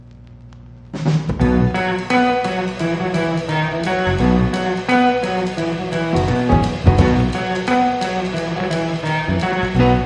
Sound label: Instrumental